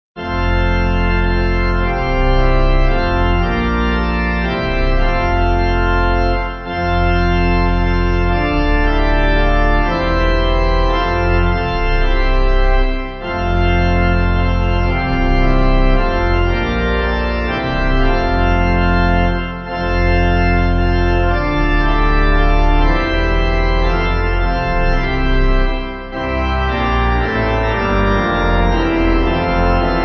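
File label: Pipe, Pipe Organ backing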